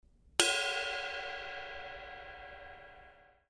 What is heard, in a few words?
K Con Bounce Bell, cymbal